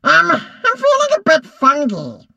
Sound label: hurt vo; 纸片人科迪琉斯; 卡通工作室